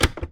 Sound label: deck close